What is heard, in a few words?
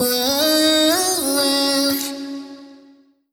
Vocal Sample Pack Phrases B; Slate Digital Omnivox Vocal Sample Pack